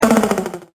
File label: Drum